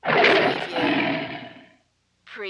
Tiger Vintage Roar

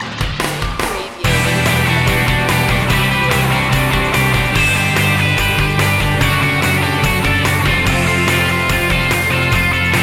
pinkday sting

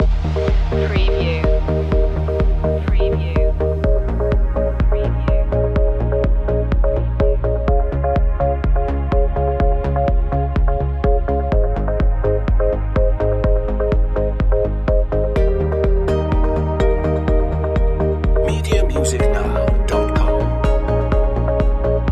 cloud environment loop

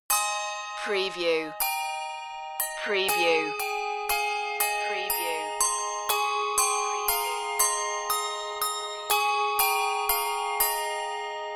handbells; wassail wassail

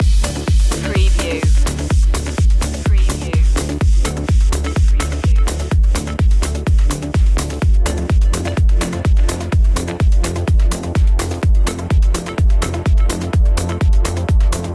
progressive skill loop

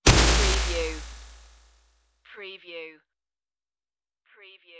futuristic bullet impact

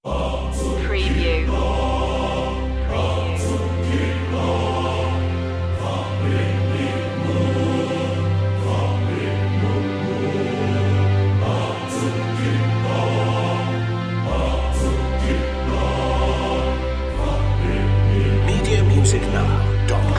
string pad choir

